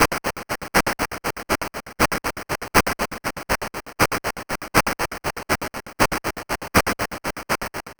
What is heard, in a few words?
PROTOTECHNO